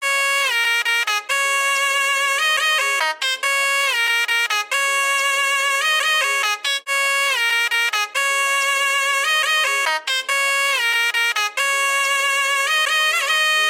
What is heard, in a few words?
Baraat shenai loop BPM 140 C; Gully Loops